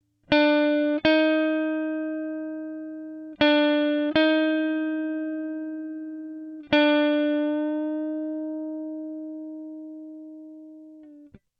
bend

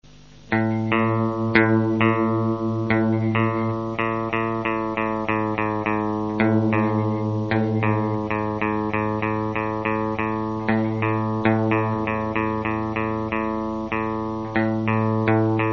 tuning

